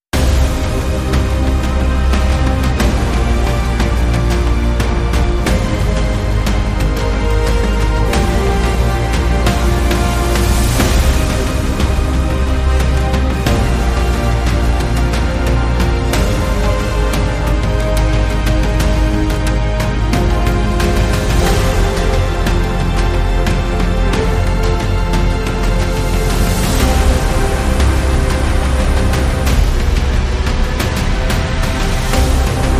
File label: Short No Choir